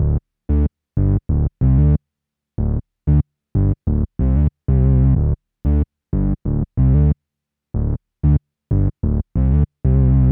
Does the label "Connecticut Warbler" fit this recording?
No